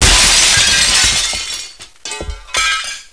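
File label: large, impact explosion; SINDEMO; glass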